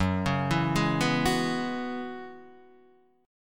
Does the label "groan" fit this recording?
No